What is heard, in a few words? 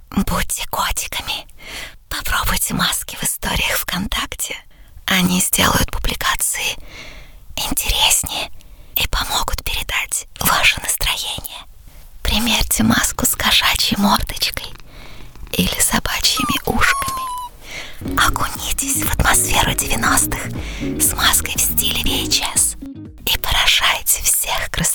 ASMR; Mask VK